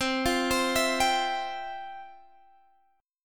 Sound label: F Major 7th Suspended 2nd, chord